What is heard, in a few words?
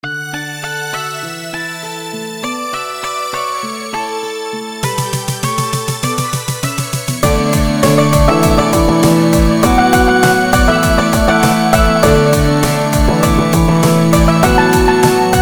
Theme Guitar Techno Cover